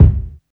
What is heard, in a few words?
subby kickdrum sound D key